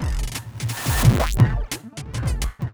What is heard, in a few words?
Glitched Beat 175BPM; glitch kit